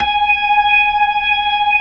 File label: B-3, Jazz